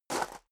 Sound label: step high heels gravel medium slide short; schritte; Footsteps; menschen